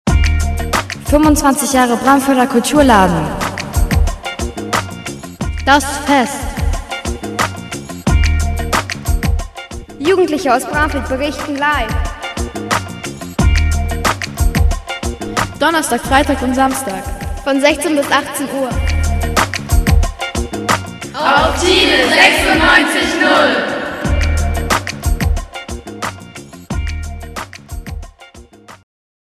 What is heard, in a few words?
Jingle